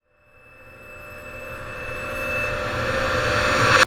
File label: Waterphone Rush; Sonic Foundry (Sony Creative Software); The Electronic Music Manuscript Collection